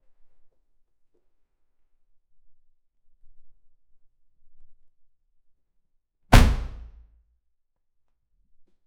body slam sound floor creaks

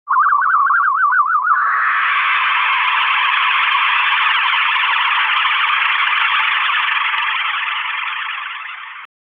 frequency modulated ufo

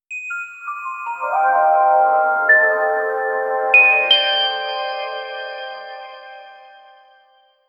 gentle calming, intro

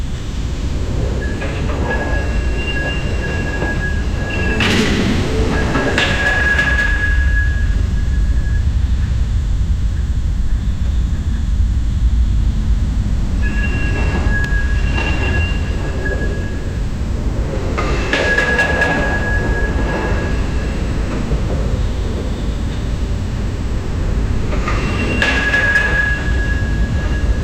sound effect the skeletal, derailment

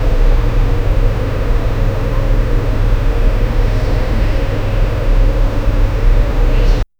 room tone interior office

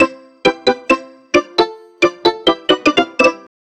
just need a 3s stinger, bubbly

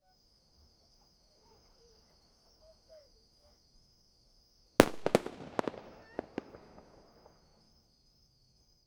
people having a good time, holiday

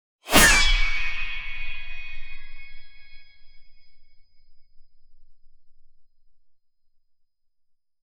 elegant mingcho style swo, high-end

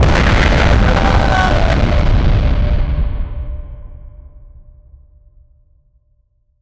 an expolosion signifying, apocalypse

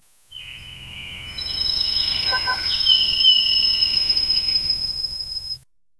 soft morning jungle ambie

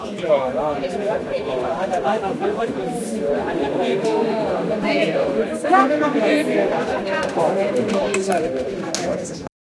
crowd scene of many stude

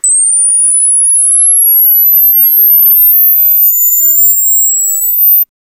camera flash with more ba

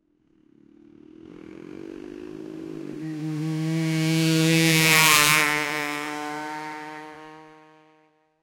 motorbike 250cc revving

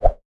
short soft wind, motion

